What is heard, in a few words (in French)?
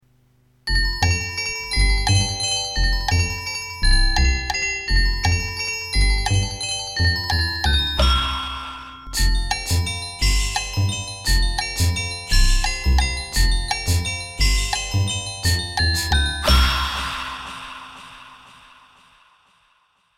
cloches